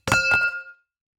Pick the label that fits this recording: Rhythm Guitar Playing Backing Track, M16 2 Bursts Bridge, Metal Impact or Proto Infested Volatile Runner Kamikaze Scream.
Metal Impact